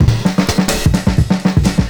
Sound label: FILLS, 70_s Breakbeats